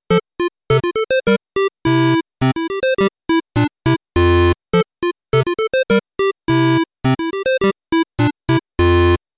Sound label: 8 - BIT ARCADE GAME MUSAK CHEERFUL; Gravity Falls Season 1 - Soundtrack Excerpts Vol. 3